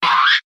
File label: oowee, Swish